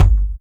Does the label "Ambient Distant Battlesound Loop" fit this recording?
No